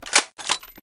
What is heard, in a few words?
awp reload; EMXArms; pmg90